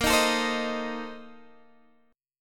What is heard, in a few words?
A sharp Major 7th Suspended 2nd Suspended 4th, Guitar Standard; strummed; JGuitar